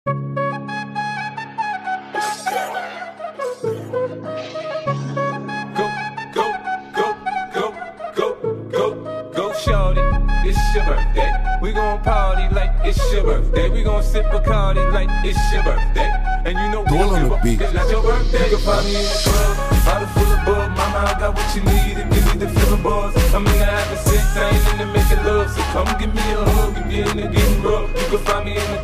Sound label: remix